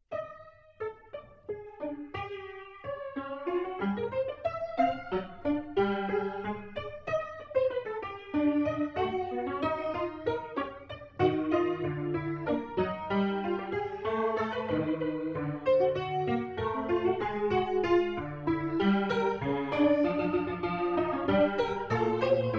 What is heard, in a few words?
slow pop piano